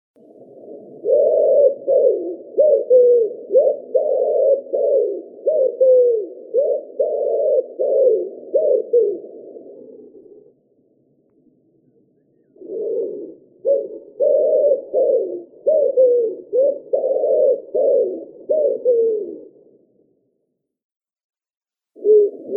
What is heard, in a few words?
vyahir columba palumbus